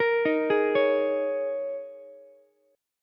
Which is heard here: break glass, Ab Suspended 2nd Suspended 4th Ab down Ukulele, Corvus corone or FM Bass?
Ab Suspended 2nd Suspended 4th Ab down Ukulele